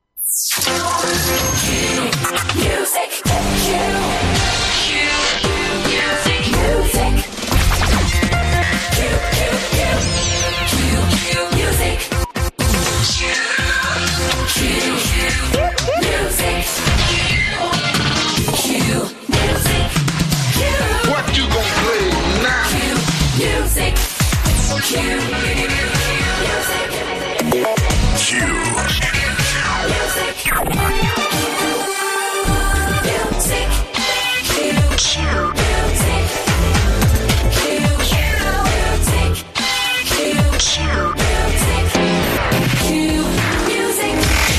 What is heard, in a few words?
jingles